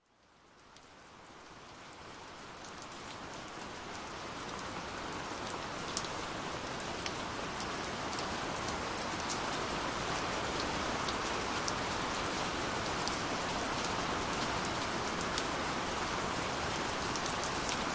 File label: Just Rain